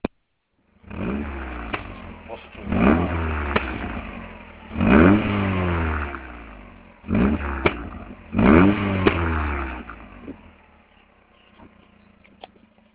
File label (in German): Auspuff; astra